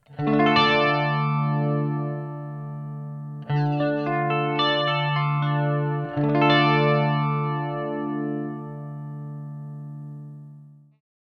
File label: Chorus; TC Electronic 2290 P